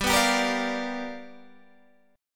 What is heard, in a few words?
G 7th Sharp 9th G chord, Guitar Open G